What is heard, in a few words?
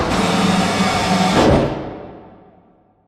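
Assembly Plate Lift Down Rock Distant; Boomtown; Titanfall 2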